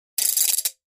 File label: Mill Clack Wooden, percussion shaker